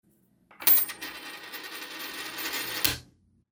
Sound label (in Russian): monetku ostanavlivajut rukoj